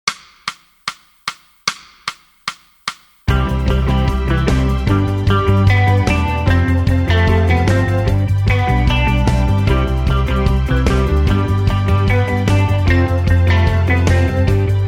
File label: Guitar